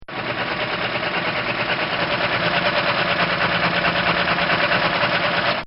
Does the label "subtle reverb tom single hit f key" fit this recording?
No